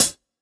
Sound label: Acousti Hat Hit, hihat; ultimate hihat; Hits